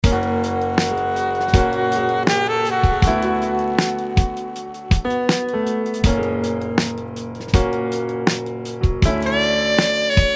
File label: Sombre Sax